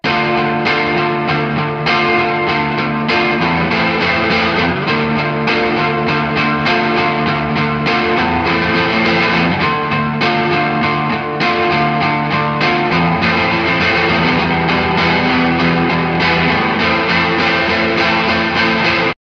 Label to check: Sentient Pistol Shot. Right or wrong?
Wrong